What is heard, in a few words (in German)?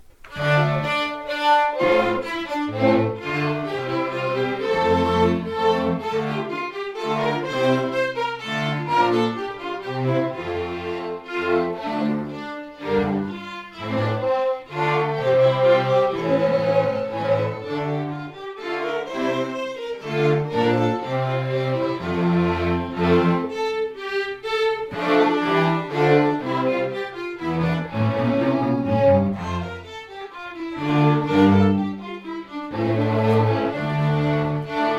streicher bassedance